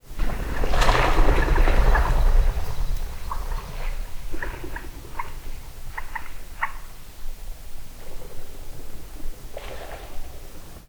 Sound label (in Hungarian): felszall, tokesrece; termeszetben; allathangok